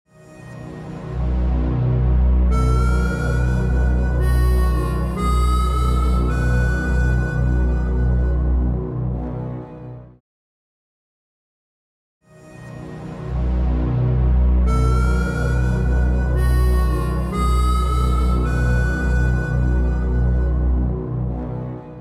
Portamento

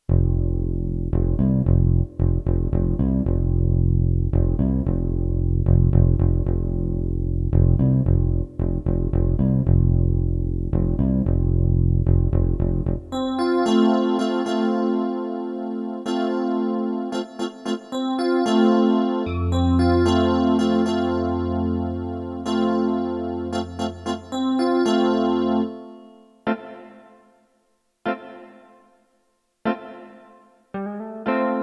World Chord Parts

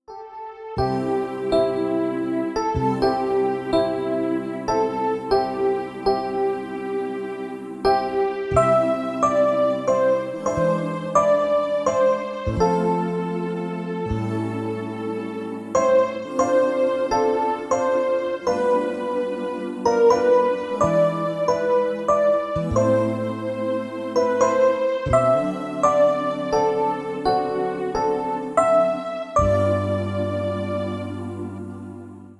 Strings & Piano

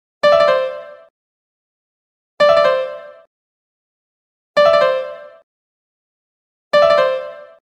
pralltriller

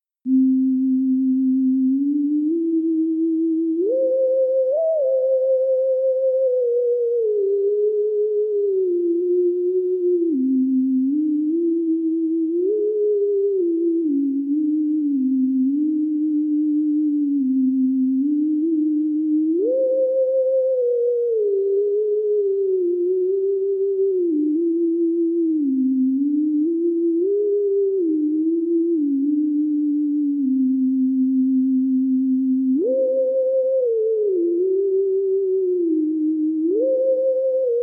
last rose theremin

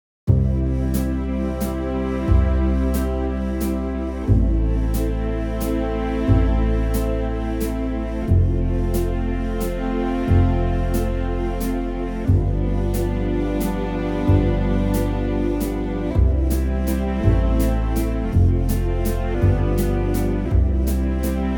Summer Waltz

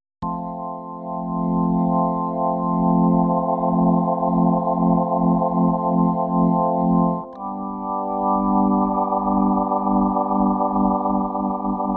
Organ Tremolo

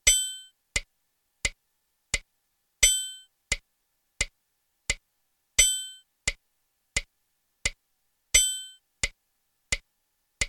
Metronome

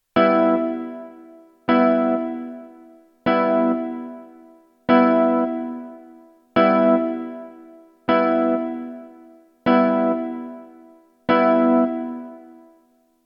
New Chord

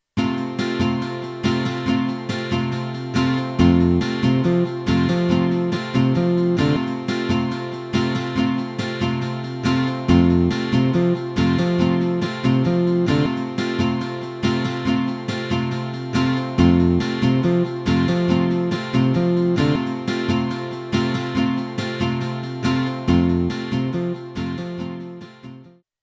Panned Backing

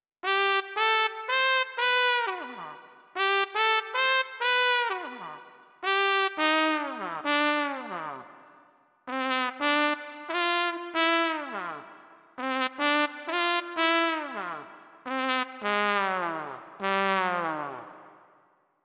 Trumpet Fall

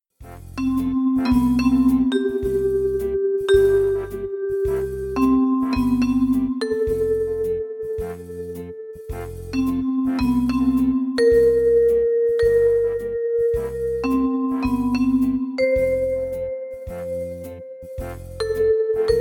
reverb

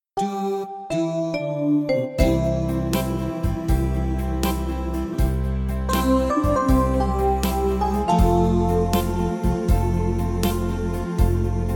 STYLE BREAK

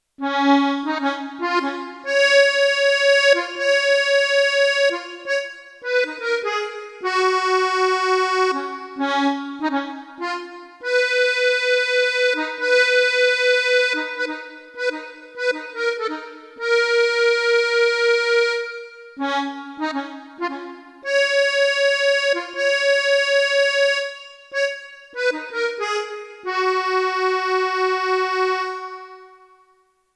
monophonic

